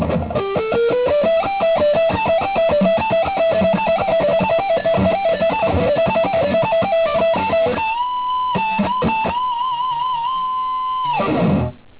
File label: chopper blade